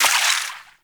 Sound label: Water Sprayer Surface Impact; Warframe; Weapons